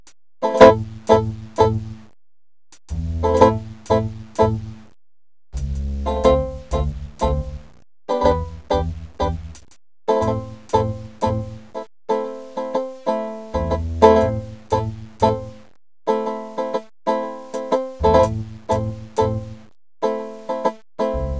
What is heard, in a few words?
banjo